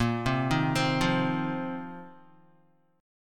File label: A sharp Suspended 2nd Suspended 4th, Guitar; 5,3,3,5,5,x; 4F,4A,5C,5G,5C,6E; arpeggiated